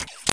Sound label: ROTATE